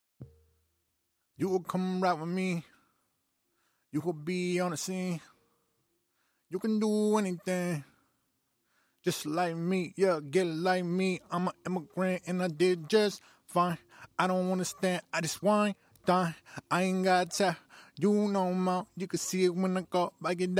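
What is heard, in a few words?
hip hop, 94, F sharp minor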